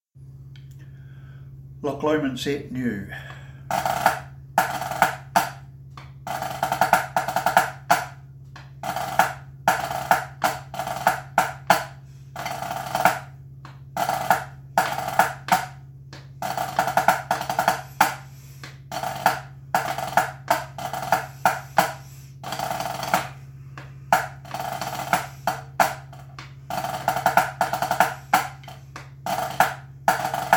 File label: loch lomond set, pipe band